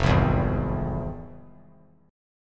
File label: F Minor Major 7th; FmM7 strummed; 5,7,7,6; 3C,3F,3A,3D